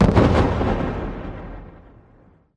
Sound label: fireworks